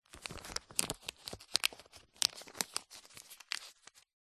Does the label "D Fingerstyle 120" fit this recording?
No